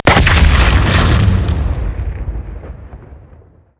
spider smash; LoL

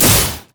grenade; rumble

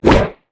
yeti basic attack; nunu

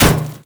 axethrow land; pentakill olaf

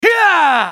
spellcast effort; BlindMonk

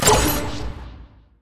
ping; LoL; vi

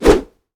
basic attack knee; rengar

